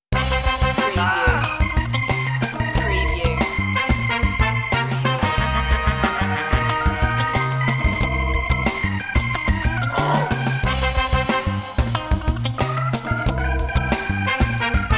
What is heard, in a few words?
sax machine 60s